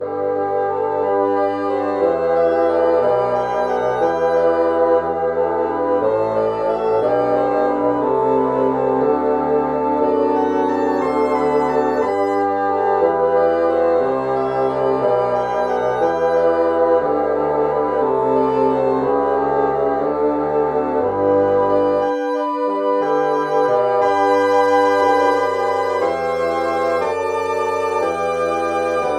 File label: bassoon